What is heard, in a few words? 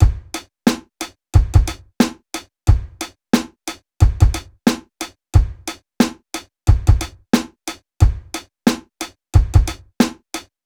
Rock loop; Reference Beat